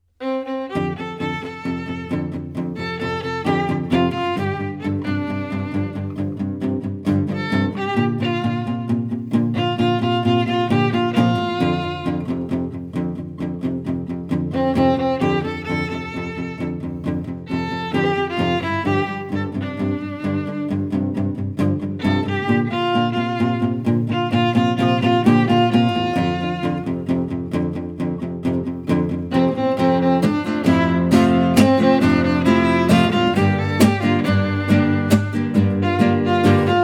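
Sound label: Violin Guitar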